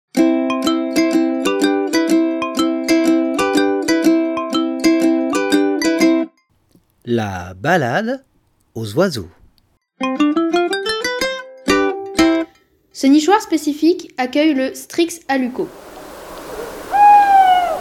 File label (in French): chouette hulotte; la balade aux oiseaux